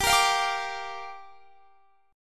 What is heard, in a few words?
G Minor 7th